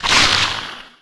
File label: King Spider Idle